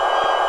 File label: jet motor; astrorally